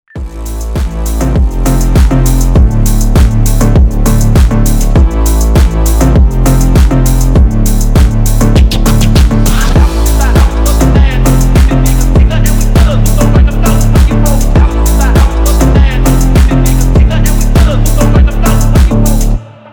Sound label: instrumental